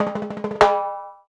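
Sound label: Cha Cha Cha Fills; Spectrasonics; Supreme Beats; African Contemporary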